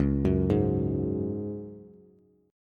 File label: Db Suspended 4th Db, Bass; arpeggiated